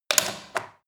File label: power socket plug